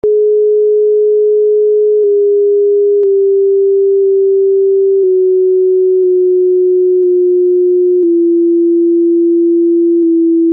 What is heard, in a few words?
Soprani+Alti+Tenori range 3 mixed descendant scales 157+138+126 cents; 19 pitch